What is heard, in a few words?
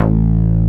MOOGY BLUES, BASSOUNDS; Masterbits; Dance Trax